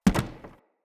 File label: Wood Stool Impact Light